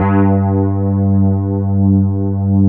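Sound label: Fender Rhodes G4; Keyboards Old School; USB Soundscan vol.09; AKAI; FEND.ROAD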